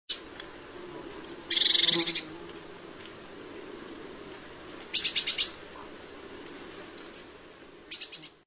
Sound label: tit